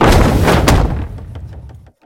grohot ot razvalivshejsya mebeli

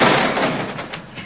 vivalivayut musor iz musornogo baka

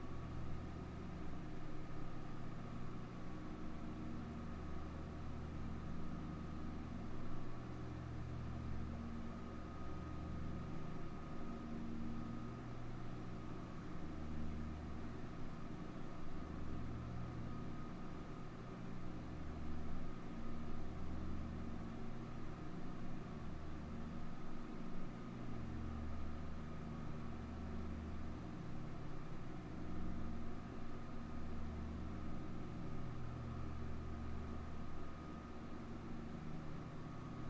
gulkij gustoj dalyokij zvuk raboti proizvodstva

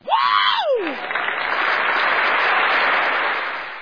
aplodismenti gruppi lyudej s voplem

